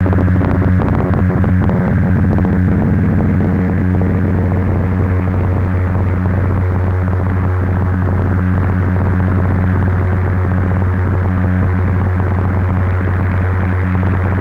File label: Deep Nuclear Drone